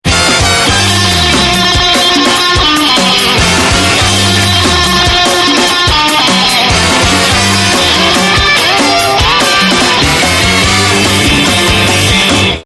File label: funky ring